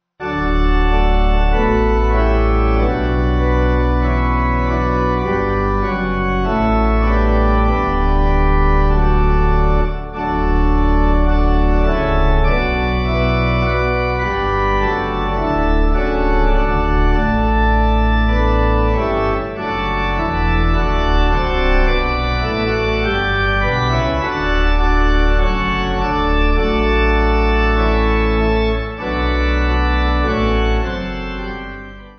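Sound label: Pipe organ, church music backing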